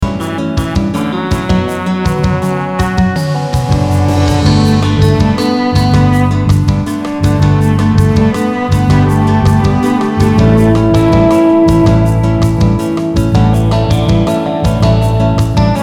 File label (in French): Basse precision et General, Basse Précision dans l’arrangement